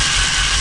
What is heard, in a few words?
Laser Travel Loop